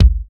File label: clean steel kick drum sound b key